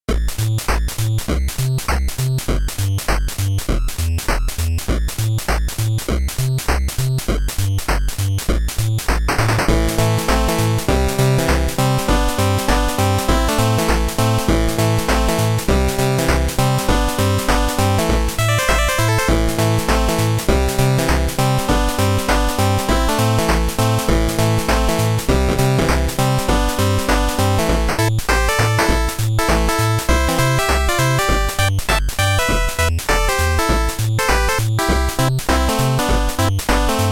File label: Funk, 8-bit